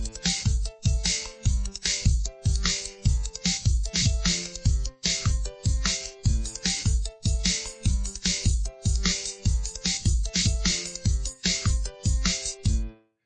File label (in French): batterie